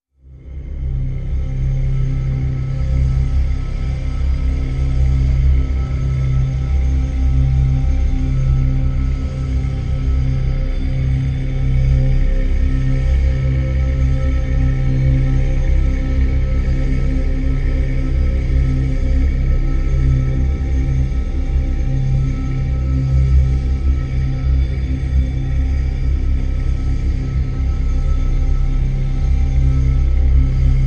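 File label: drone; Crevice C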